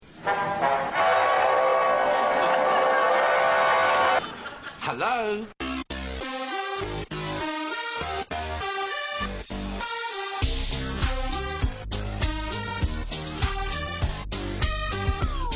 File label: ringtone